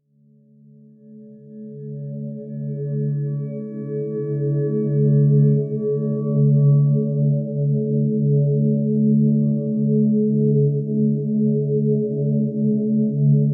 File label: 852 Hz